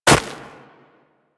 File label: Run Snow, Footsteps; Warframe; Operator